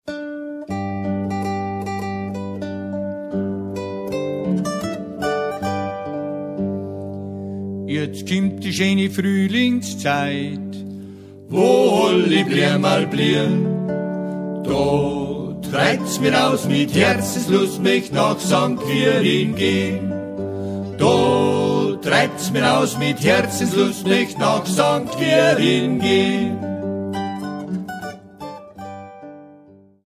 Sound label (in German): lied